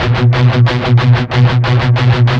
16TH, Guitars; Spectrasonics; Hans Zimmer Guitars VOL-1; MED 16TH

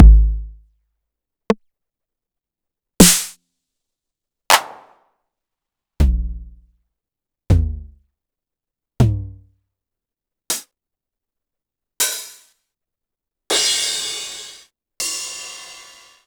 909 KIT SLICE, drums

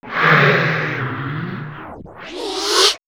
Man Machine "Breath", robot vox; X-Static Goldmine

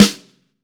SN, LA SNARE, Snare

